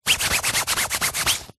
raincoat jacket itch scratch rub

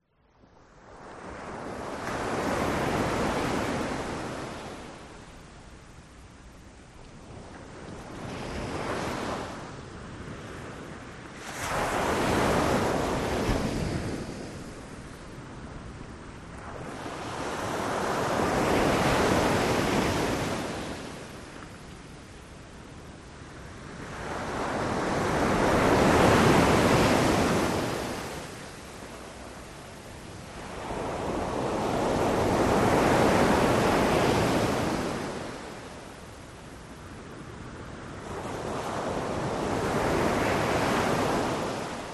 Beach Sea Waves; west wolf

